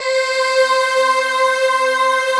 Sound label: breath choir; Optical Media International - Sonic Images Library; Badbreath